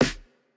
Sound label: Jrock snare